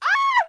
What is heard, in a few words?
die swordman female